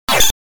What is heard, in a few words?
Putting up a Sword; Tower of Druaga; arcade